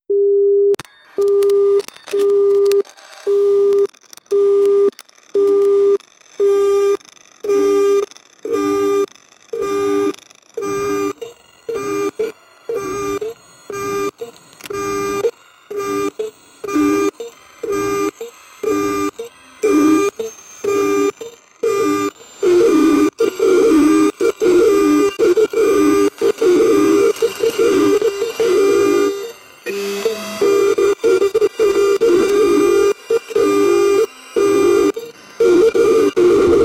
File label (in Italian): si sentono dei rumori, laboratory